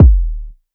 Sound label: Kick Skinny, 808 Kicks; Slate; Vintage Drum Machines Volume 2 808 Edition